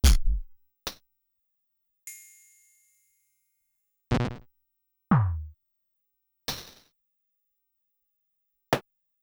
LXR; Sonic-Potions

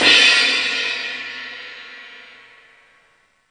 CYMBALS, Disco House; USB Soundscan vol.20; Fresh Disco House I; AKAI

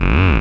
bass F#, Talking Bass, Dance Synths

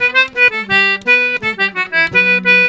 C3 R, Celtic Flavours; USB Soundscan vol.42; AKAI

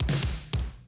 125, Classic Drum Loops

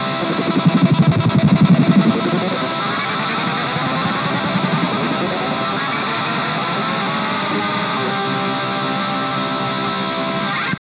tap hound; Spectrasonic Distorted Reality 1

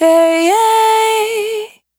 Urban Dry, Single Voice Melodic Loops

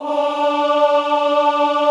OHH D3, Classical Choir; Best Service; Extended Classical Choir

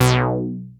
ARP 2600 B3, synthesizer; Hollywood Edge - Giorgio Moroder Rare Synthesizer Collection

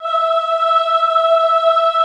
AHH E4, Extended Classical Choir; Best Service

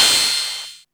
BLAST CYMBAL; Back In Time Records; Big Bang; THE HIP

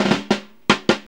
FILL; Zero-G; Funky Elements

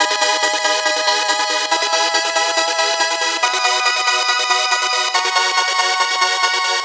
140BPM G#; Vengeance - Essential Clubsounds Vol.4

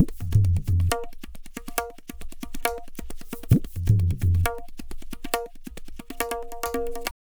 Pure Tabla, 135BPM; Zero-G

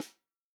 snare drum, POP SNARE CHROMATIC

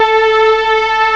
HISTRNG, CMI Factory

